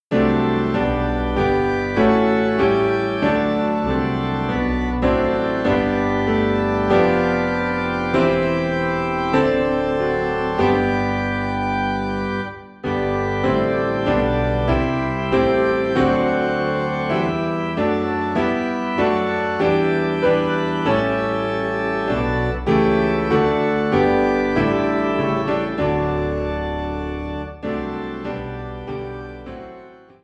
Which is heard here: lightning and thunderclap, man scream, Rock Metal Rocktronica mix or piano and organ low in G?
piano and organ low in G